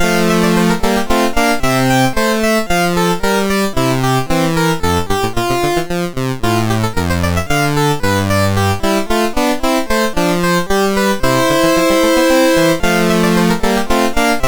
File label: Courante, MOS6581R2